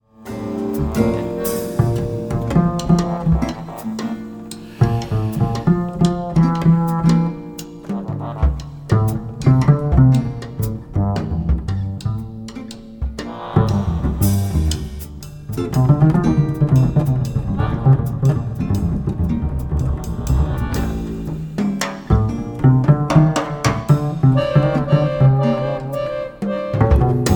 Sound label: Mankoblues